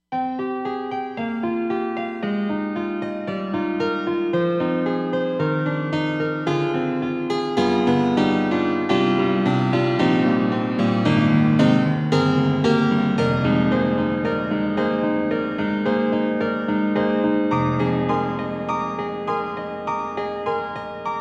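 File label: Roland SD-50 Stage Piano 2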